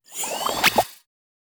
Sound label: revive; fallguys